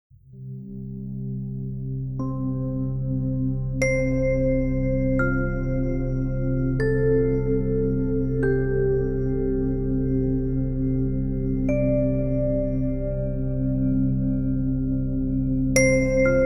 Rain Sounds White Noise Nature Sounds

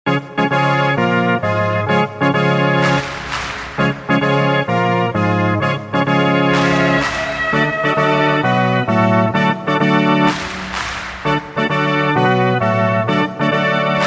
Hockey Organ

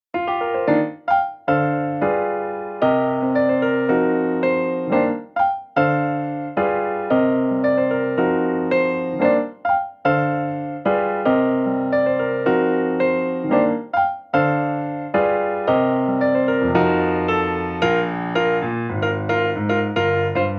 Light Piano Music

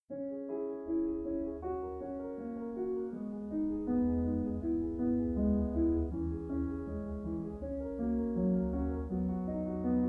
Lullaby

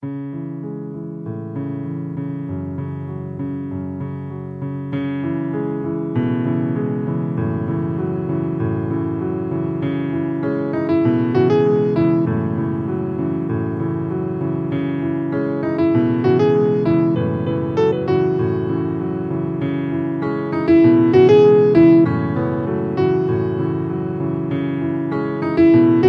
Piano Version

